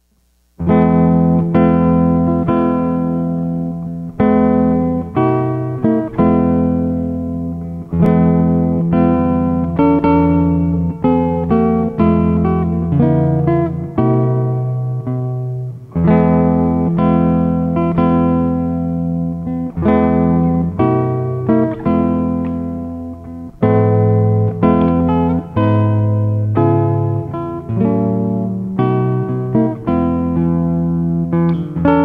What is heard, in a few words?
Bridal Chorus, guitar